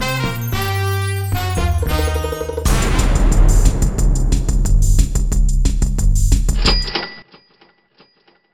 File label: Jackpot Reel Win; CashBomb